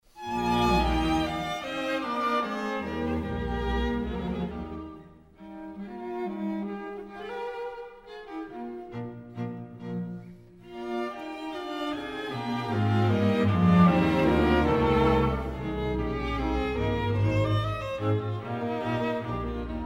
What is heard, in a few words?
quintet; eybler